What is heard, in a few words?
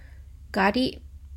gari, Biting something hard like a popsicle; ガリッ – gari; manga onomatopoeia sound effects